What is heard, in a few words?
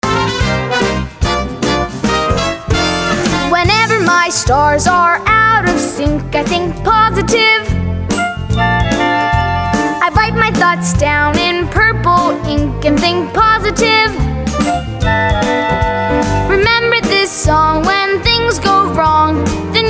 Vocal; audition materials; Willy Wonka Jr